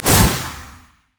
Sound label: souleater; LoL; ahri